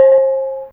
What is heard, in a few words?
Holstein A3, Bells; Fairlight CMI IIe